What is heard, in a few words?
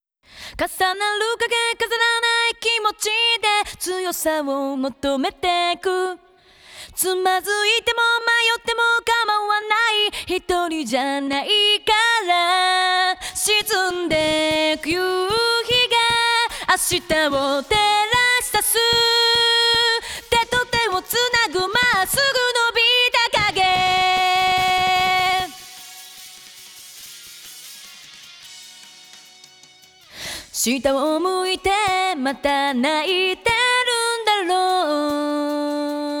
Acapella; Gintama